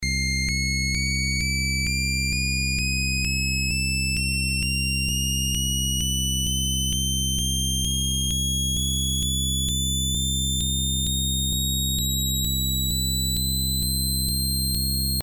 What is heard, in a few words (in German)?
oberton